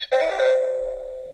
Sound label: bururummm